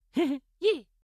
Hehe Ye

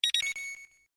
New Mail; Mgs4

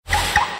Car Lock

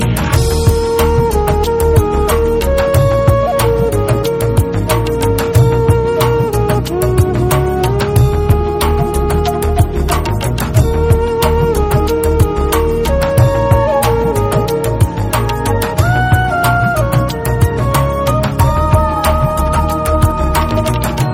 Angel soothing Tone

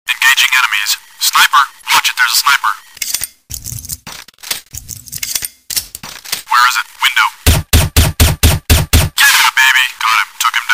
Sniper Shot

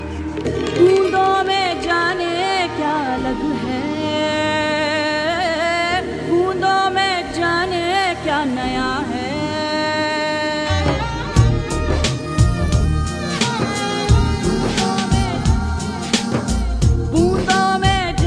Super Smoooth

